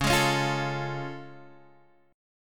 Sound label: A Augmented Major 7th Db